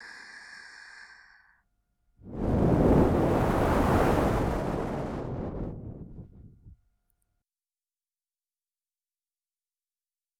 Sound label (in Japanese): 耳ふき right